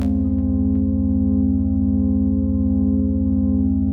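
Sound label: 122 BPM Pads C major A minor Tonic C, ambient synth pads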